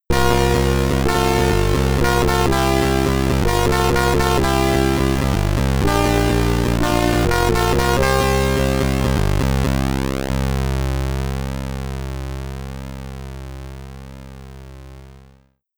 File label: Stage Clear; Gemini Wing; Commodore 64